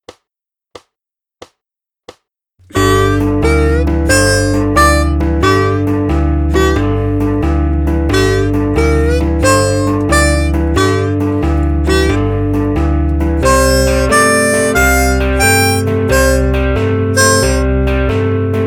Minor Pentatonic Blues 90bpm

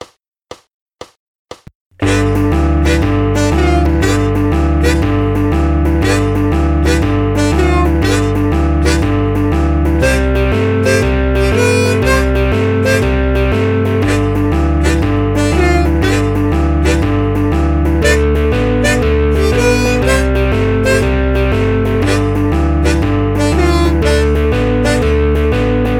Blues 120bpm

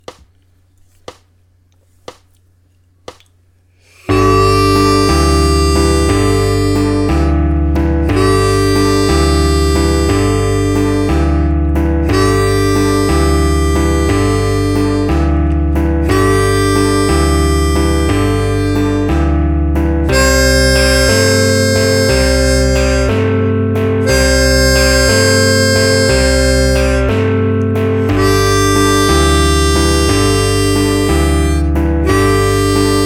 Root Note Blues 60bpm

